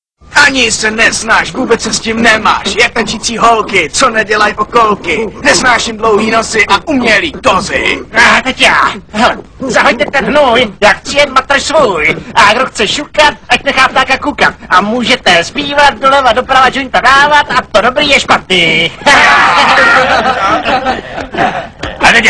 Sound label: rap